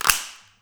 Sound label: cracker; woolworths